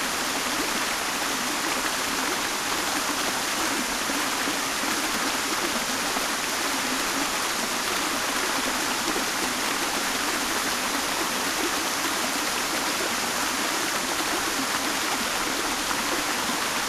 Small Water Fall Bottom Close; Homestead; Titanfall 2

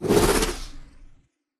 cloak interrupt end; Titanfall 2

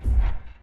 footstep jog; Titanfall 2

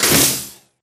imp bullet lightballistic shieldwall sizzle; Titanfall 2

